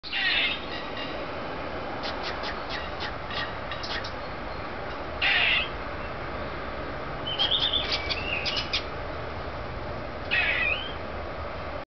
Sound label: Squirrel mating call